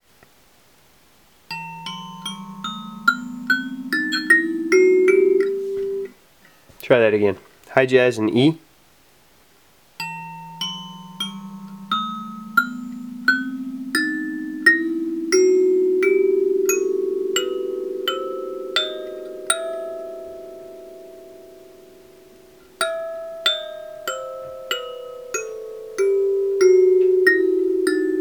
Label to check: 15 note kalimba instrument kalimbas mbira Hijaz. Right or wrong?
Right